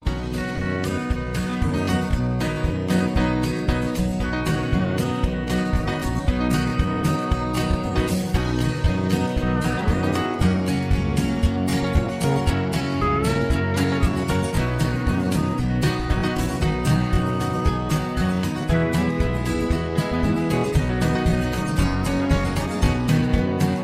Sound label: High Key